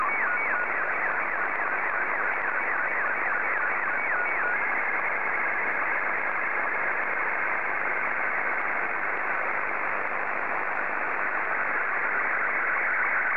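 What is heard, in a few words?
SSTV, Scottie 2; JK7BUM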